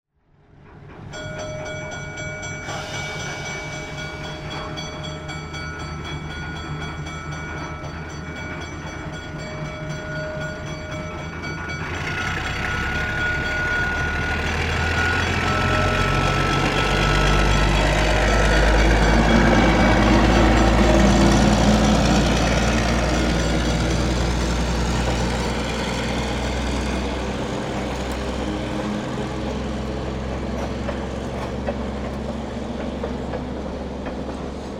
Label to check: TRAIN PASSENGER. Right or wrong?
Right